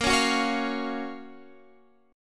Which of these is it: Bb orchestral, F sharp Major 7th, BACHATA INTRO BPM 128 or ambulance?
F sharp Major 7th